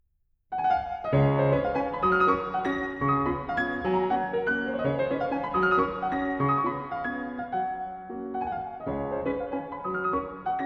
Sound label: Waltz No.11 In G Flat; Op.70 No.1